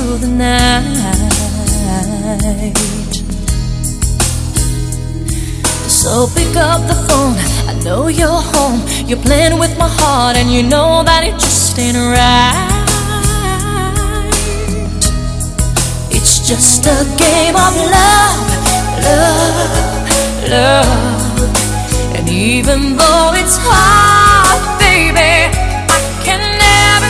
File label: pop